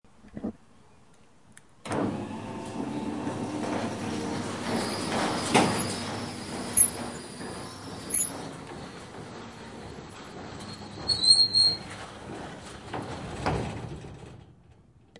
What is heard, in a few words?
automatic garage roller door